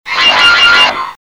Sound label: laser